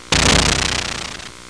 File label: snarl